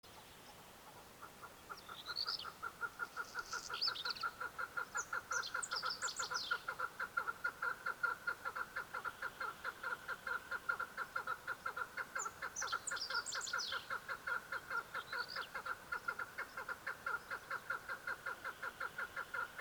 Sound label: Chukar; کبک چوکار